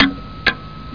Old Clock Ticking

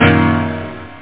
PIA; Groovy Bytes: Behind the Moon

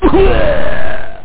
puke; apogee

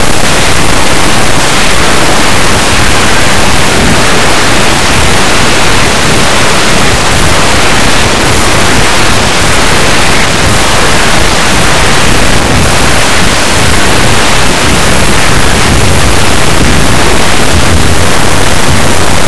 walk; Blue Heat

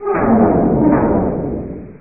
gate

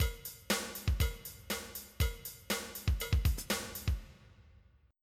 funk rock

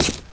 step, footstep, pave

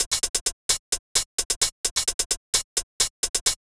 danceflow bpm130, drumloops

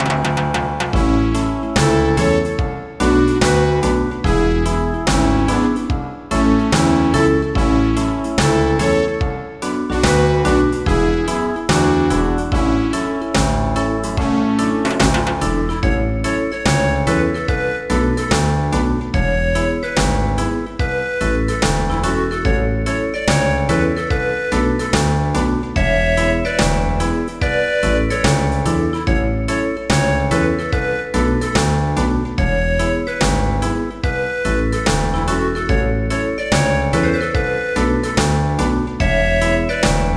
Reggae

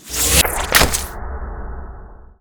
punch in; Spider-Man CD Cardz Mary Jane Watson

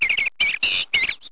bird; Deers Revenge

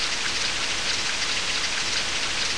RAIN LOOP; RenderOrgan

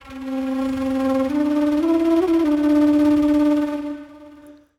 FLUTE ETHNO 125

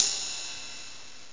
RIDE

